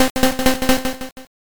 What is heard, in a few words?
chew